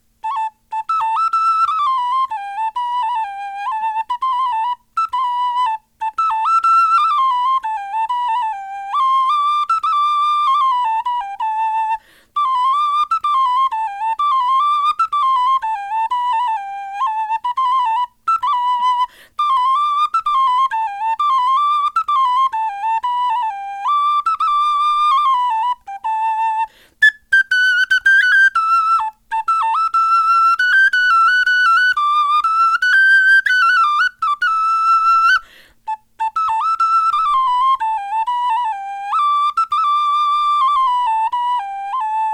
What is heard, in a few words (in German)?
melodie